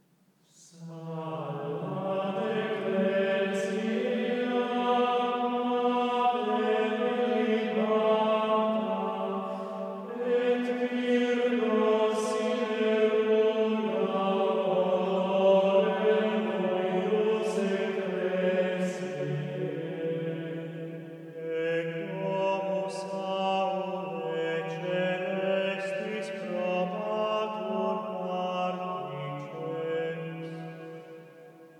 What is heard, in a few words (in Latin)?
Psallat ecclesia mater Sequentia; Schola Cantorum Riga; Missa in Dedicatione Ecclesiae